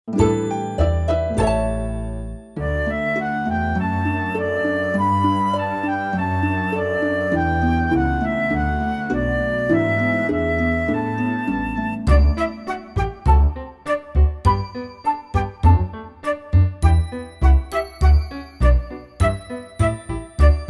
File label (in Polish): Zabawa Legato i staccato